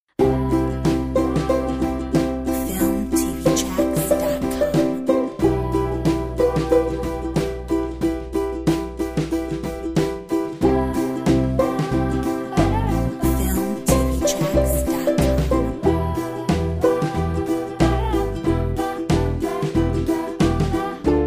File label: Miss smile